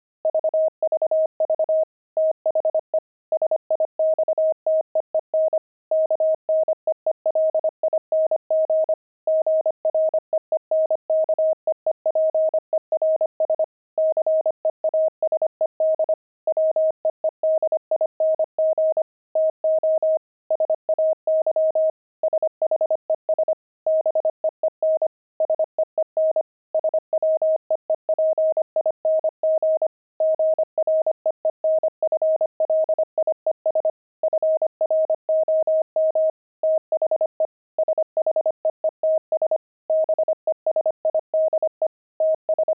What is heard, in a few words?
pangram, intermediate pangrams files; beehive